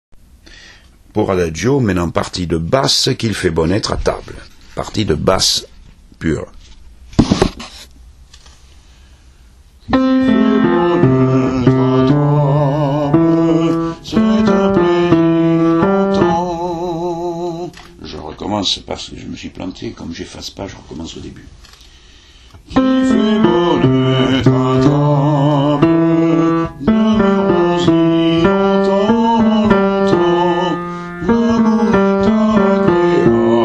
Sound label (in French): Basse; ADAGIO; Quil fait bon être à table